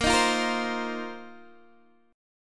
A sharp Suspended 2nd Suspended 4th down Guitar DADGAD